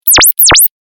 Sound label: Sms Zap; Samsung